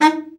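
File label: baritone, BARITON SAX; Best Service; Brass Super Section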